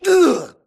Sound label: grunt death quick; Titanfall 2